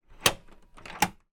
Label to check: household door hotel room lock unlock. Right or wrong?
Right